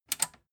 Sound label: metal button tiny click disable fast